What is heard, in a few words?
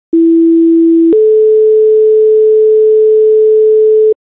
siren, tones; elk